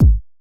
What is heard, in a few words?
kick; Electro; futurefunk